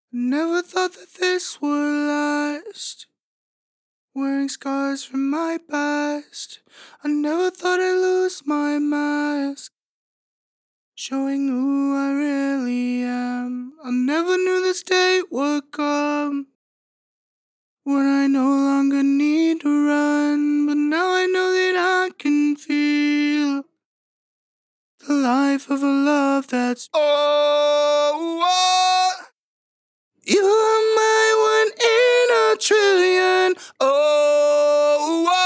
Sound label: Harmony Right Bmin 140bpm Dry, Male Acapella; Ghosthack